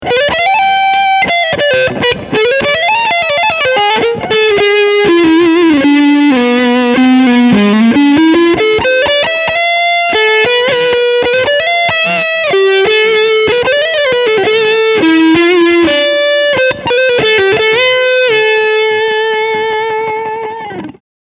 Leadsound Front PU

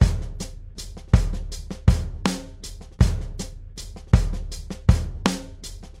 Hard Rock Intro; Zoom R20

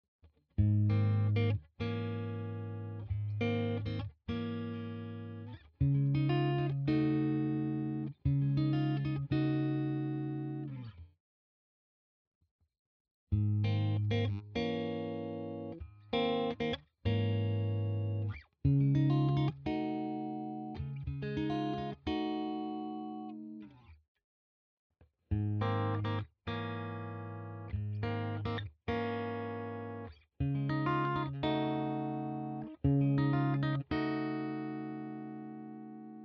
JA 60 CC all positions clean Fingerstyle

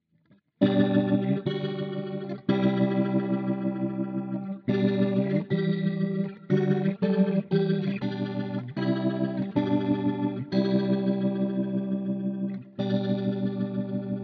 Rotary Echo

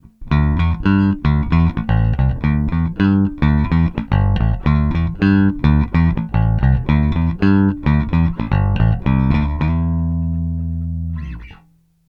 Middle bridge parallel pick

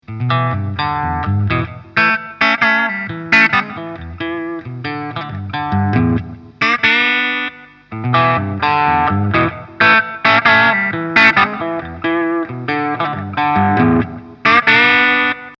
clean dann fx on tone 5 gain 5

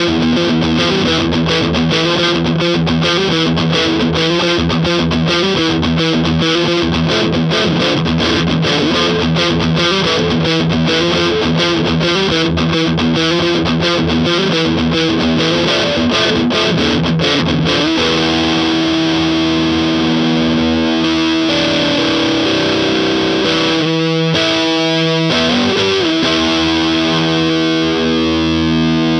ESP Active Mode Both Pickups; Ben Weinman

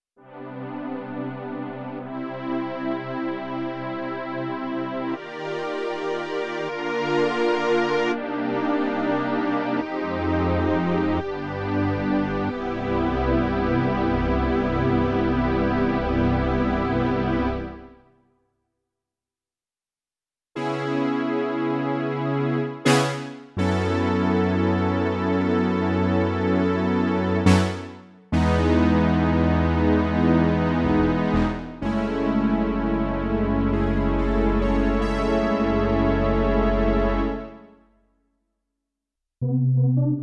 Synth

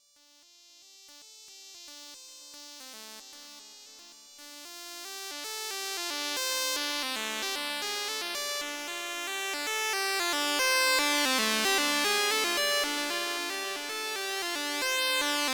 A110 PWM sequ Xpander Filter

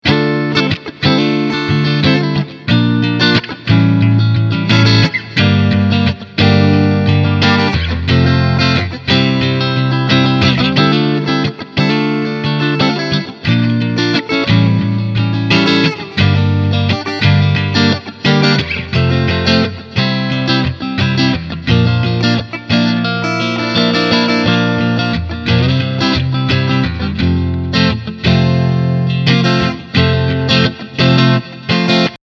Clean Strumming Front + Mid

